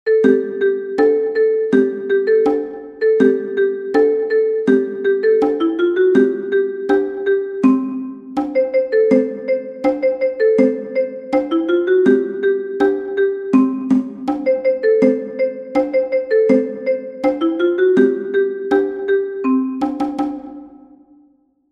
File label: Cajon; Die Affen rasen durch den Wald